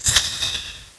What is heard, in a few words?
Magic; Majesty; OnlineGame